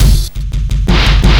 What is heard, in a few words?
LOOP, Drum & Bass, 170 BPM